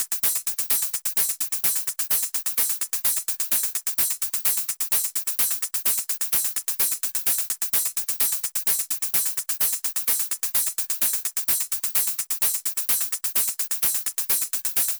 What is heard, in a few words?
PERC 128 C3